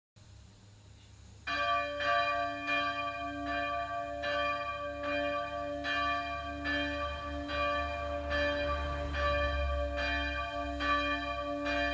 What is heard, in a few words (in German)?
Glockengeläute